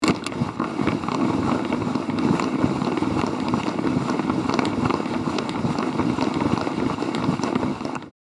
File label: Music box noise